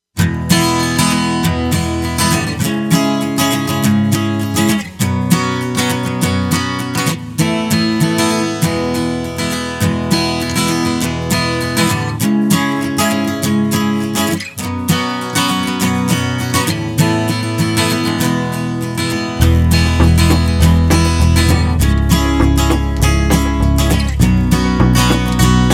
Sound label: Folk Bed